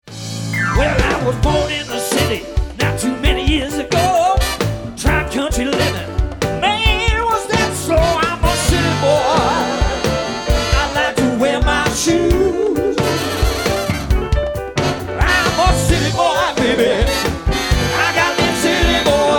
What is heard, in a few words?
City Boy Blues